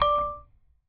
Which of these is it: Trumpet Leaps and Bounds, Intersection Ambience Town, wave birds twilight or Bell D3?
Bell D3